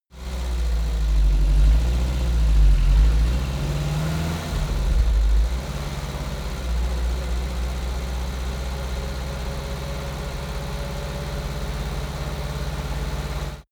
car dacia accelerate slow

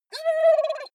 dog whine trill; natur-tiere; hunde

